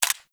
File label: SMG Mech; Weapons; Warframe; Tenno; Sundial